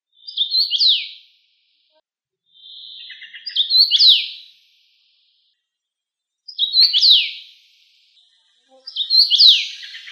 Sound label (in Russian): chechevica